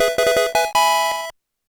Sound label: Fanfare - Received a Pokémon!